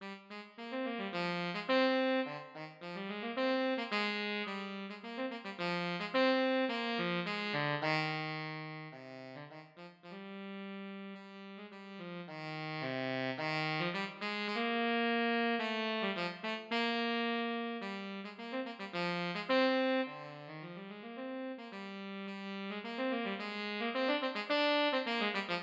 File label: Tenor Sax